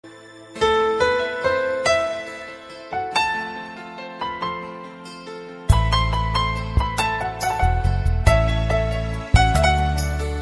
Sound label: piano ringtone